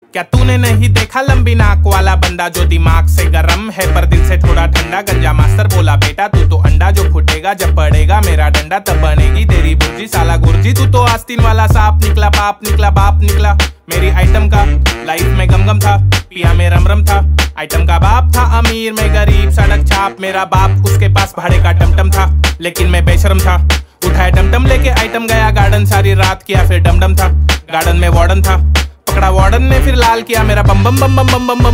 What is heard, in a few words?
RAP